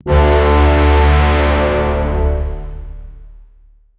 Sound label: brass